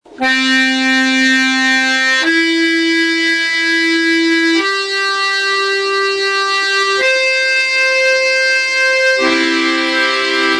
professional swing

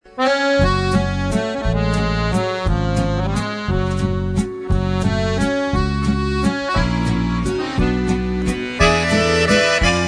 musette; professional 41 45

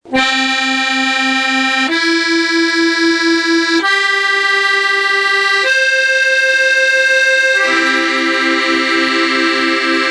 professional chromatic compact 49 french

